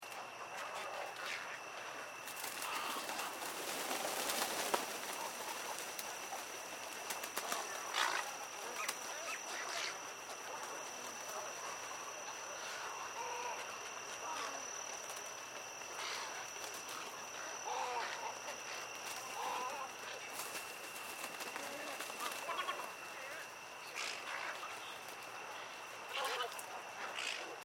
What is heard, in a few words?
Marsh Ambience Night Spooky; General evening outdoor ambience, slightly spooky night time under the open sk; Loop; Environmental Sound Effects